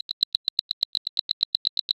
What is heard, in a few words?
short subtle ticking loop; artificial intelligence